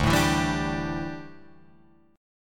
D Suspended 2nd Suspended 4th D down Guitar Drop D